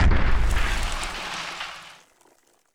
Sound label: concrete impact noattack; adams venture origins